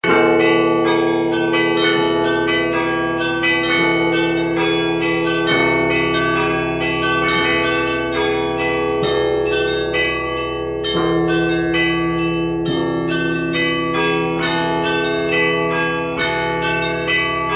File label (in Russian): obihodnyj zvon